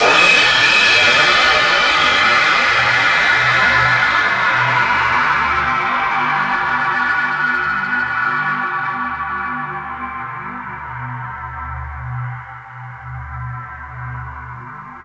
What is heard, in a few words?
cym; drums